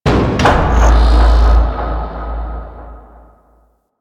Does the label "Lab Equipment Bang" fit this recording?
Yes